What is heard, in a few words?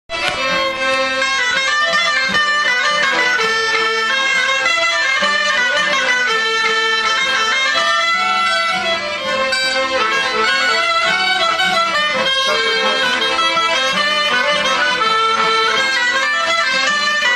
gavotte; gavotte de Lescouët